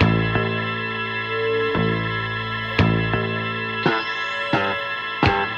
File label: keys dark funk, sad loops